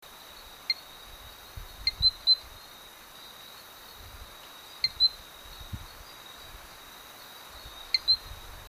eleutherodactylus abbotti los haitises national park dominican republic; Its irregular call is often heard, even during the day, in many humid areas. It consists of a short series of "tucks" followed by a series of whispery "weees".; Isabel de Torres Nature Reserve, northern Dominican Republic, Hispaniola; West Indian